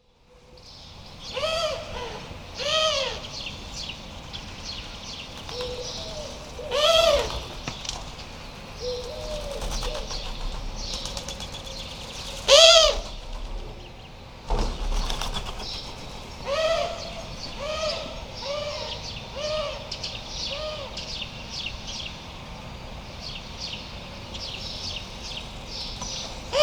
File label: Eurasian Collared Dove Streptopelia decaocto